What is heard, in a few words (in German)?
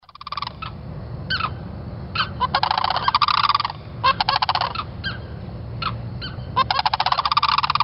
kanadakraniche celery fields